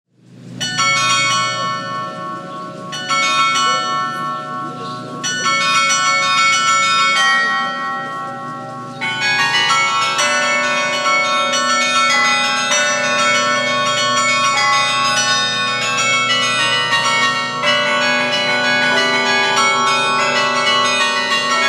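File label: carillon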